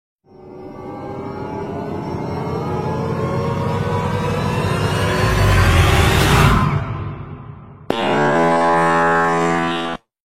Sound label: Dramatic brain fart Sound Effect